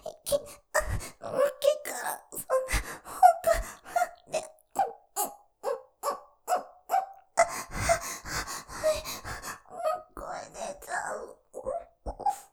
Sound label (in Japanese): 喘ぎ 声でちゃう; のっけからそんな奥、声でちゃう; ステレオ